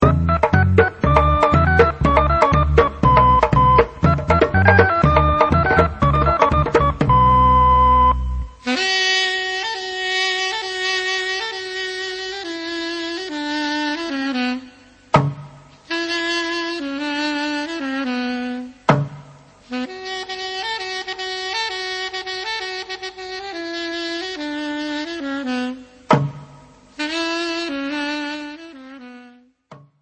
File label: Instrumental